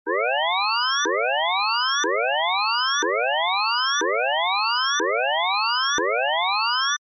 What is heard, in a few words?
panic tones